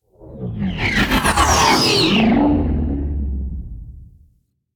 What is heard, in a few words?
Sentient Ship Close Fly By; Warframe; Enemy Ships; Space Fighter Sentient Coil Agent